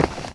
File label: hard footstep; minetestforfun game